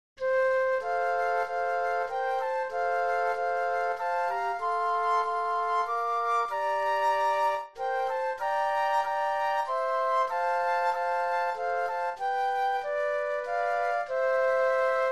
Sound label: Flute Trio; christmas